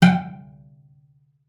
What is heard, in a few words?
CELLO FINGER G5; 90 s Sample CDs; Xsample VOL-5